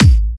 Kick; Break The Walls

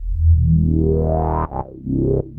Arp C Line, ARP Odyssey; musicradar-synth-samples

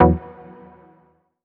Synth Cuts; Instruments; VES1 Synth Cuts

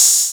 Cymbals HH Open; Vengeance Samples

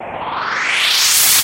VFX Short Fills & Reverse

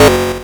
Arcade Riffs

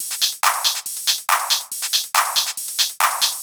Loop 140 BPM; vengeance essential club sounds-3

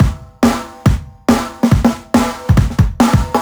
Breakbeat 140 BPM

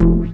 Synth Sound; Vengeance Samples; VEE Synth Shots

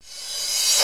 Cymbals Reverse Crash; Vengeance Samples

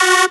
Synths F#; vengeance essential club sounds-3

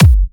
128BPM Kick; Vengeance Samples; Crystaline Kit

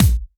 Bassdrum; Vengeance Samples; VEE Bassdrums

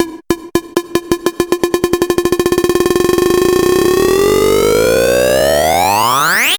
Sweeps Medium

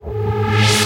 Synths G#; Vengeance Samples

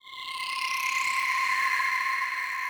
Fx

